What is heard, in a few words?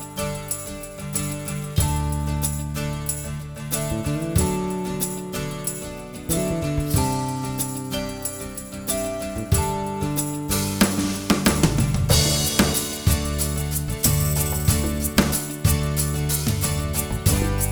instr; pakiet dzien edukacji narodowej szkola